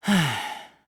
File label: sigh; kiibo